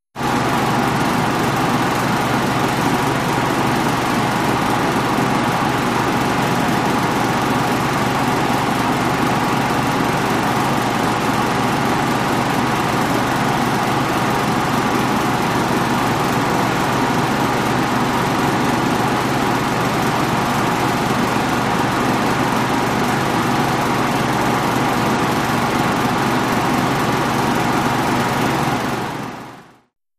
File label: Refrigerated Room, freezer